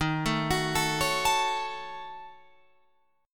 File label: D sharp Minor 7th Flat 5th, Guitar, Open F; arpeggiated